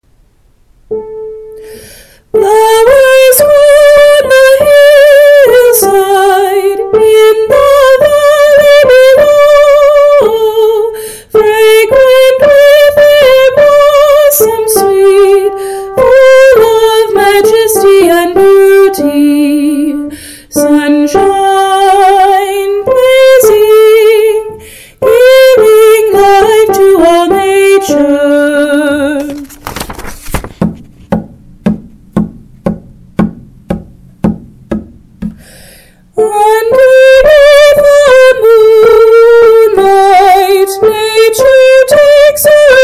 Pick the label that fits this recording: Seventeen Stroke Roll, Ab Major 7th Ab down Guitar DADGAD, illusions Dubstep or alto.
alto